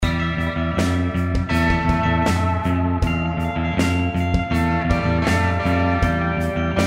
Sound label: rock